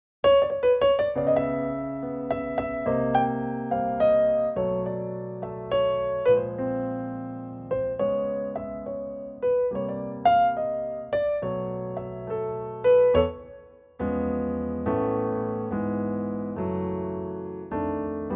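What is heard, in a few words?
A Bb piano, male; key - A to Bb - vocal range - F to G#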